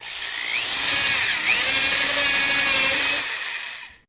Elect Drill, drills

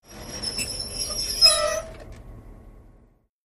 Train Wheels Squeak, diesel

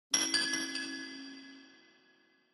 Chinese Chimes Bells; mallets

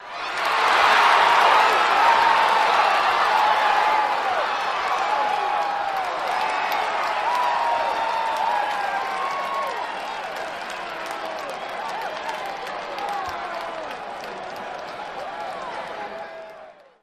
Crowd Cheer Appls, large

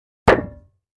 Impact Metal Sheet; flexing metal

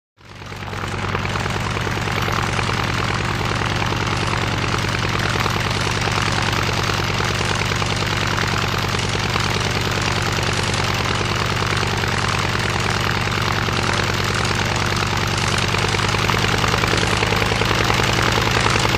Prop Plane Idle Old, vintage

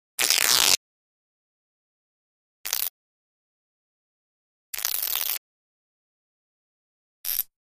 Electric Sparks

